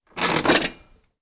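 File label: sounds of bar stool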